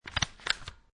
Stapler Stapling, Office stapler, staple paper together, twice.; Classroom; Schools; Lynne Music